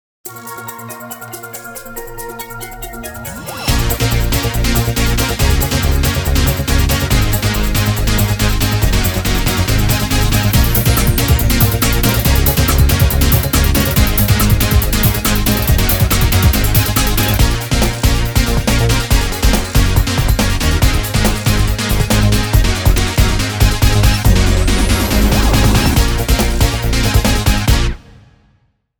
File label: groove rave